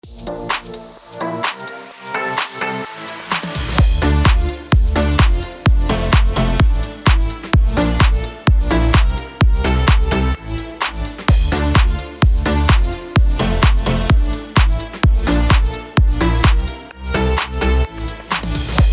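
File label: HOUSE D minor 128BPM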